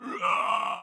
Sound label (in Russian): udar